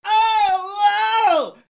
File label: ohhh ohh; alpha